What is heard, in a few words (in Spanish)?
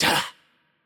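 Hurt Light, Mexican Spanish; es MX; VO; bloom minigame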